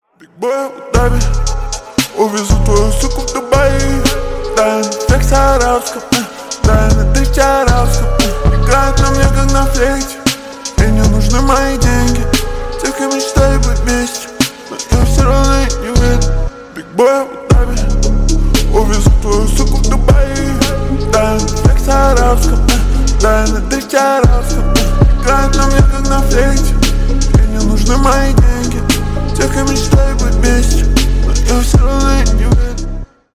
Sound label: slowed reverb